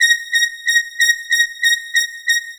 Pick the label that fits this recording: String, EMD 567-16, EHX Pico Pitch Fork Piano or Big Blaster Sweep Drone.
String